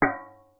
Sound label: Metal Impact; industrielle baustellen